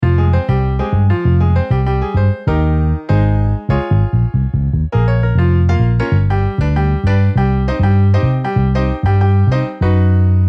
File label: Piano Bass MIDI